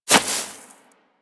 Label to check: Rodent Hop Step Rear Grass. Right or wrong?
Right